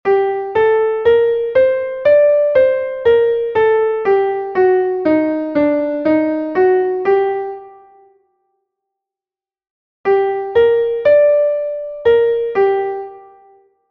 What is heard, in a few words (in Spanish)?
escala arpegio sol menor; Escala e arpexios; Entoación a capella